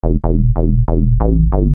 Syn-Bass m Fm, Techno Synth Loops, 140bpm; Sonic Foundry (Sony Creative Software); Signals I; SynBs

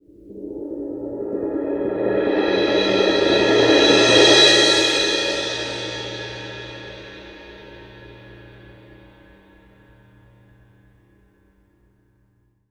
CYM CRS-R, Synclavier Percussion; ILIO; Synclavier Percussion 2; World & Orchestral

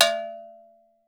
AFRICAN, African percussion; Voice Crystal - Steve Reid Definitive Percussion

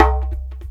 Djembe; Ambient Grooves

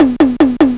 ELEC LOOP, ELECTRO K, Ragga & Dub Reggae; USB Soundscan vol.27; AKAI

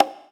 Percussion, House

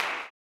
CLAP, Blues Soul; Spectrasonics Vocal Planet

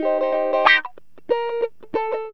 GTR, CHANK; Zero-G; Funky Elements

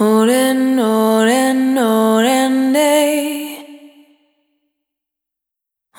Single Voice Melodic Loops

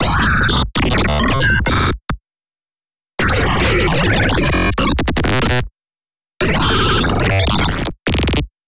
pitch freq shift Glitch

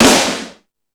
Metal Drums, E-MU Pro-Cussion; drum machine

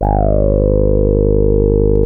TAURUS A2, synthesizer

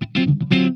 FAST CHUG, Funk Guitar; Zero G - Funk Guitar

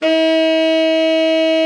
TENOR, Killer Horns, SECTION LOOP; Best Service

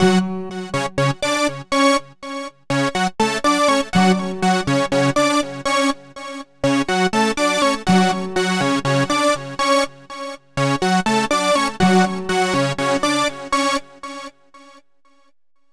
Hi Synth; Sonic Foundry (Sony Creative Software); Club Joints and Street Anthems; Razor Edge

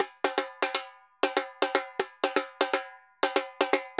Repique Bomba 120, Drums & Percussion, 120 bpm; Best Service; Festa Latina VOL-1

